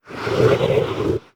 Husk; Legacy husk

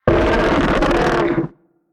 HAMMERHEAD idle; Hammerhead gold

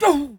male damage

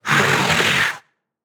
death; Barotrauma; Crawler

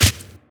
Footstep Mudraptor; Footsteps, Monsters

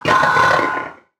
damage, fractal guardian; Legacy

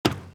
Footstep Metal; Footsteps

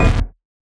burst; Watcher

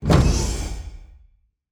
Door

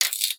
Zap; Barotrauma; Electricity

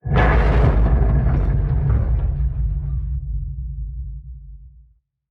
Structure Blunt; Damage

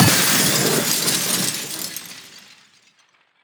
Glass Break; Damage

